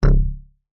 multimedia notification error buzzy fat

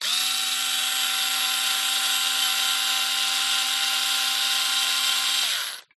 screwdriver electric waivers slight; Skyclad Sound

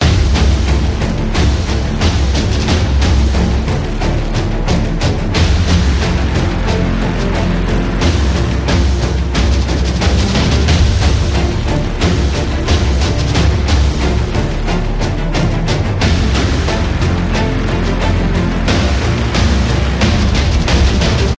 Epic; orlamusic

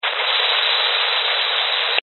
technology cb radio static burst

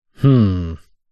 human male hmm stumped; zapsplat

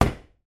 industrial leaf blower down on table

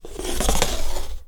medieval scythian recurve arrow draw; still north media

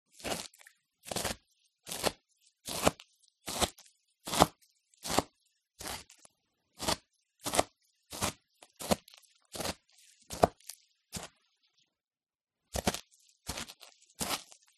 Chopping Lettuce; PMSFX

